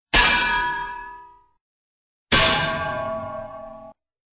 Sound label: Anvil